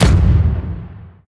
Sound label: Double Coilgun